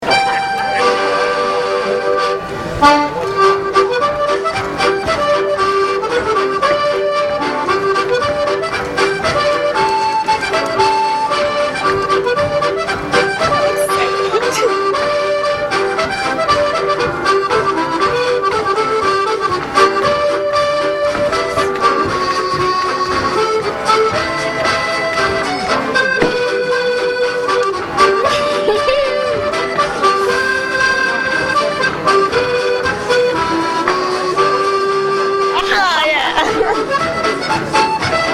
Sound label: Cakewalk, Keyboard